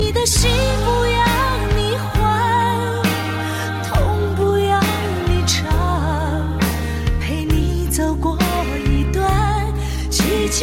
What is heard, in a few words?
chinese song ringtone